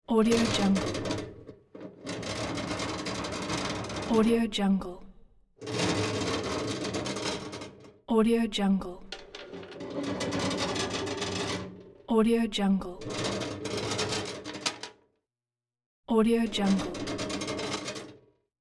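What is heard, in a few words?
industrial mechanism crank or winch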